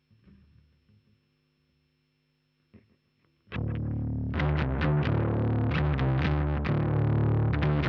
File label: Bass DI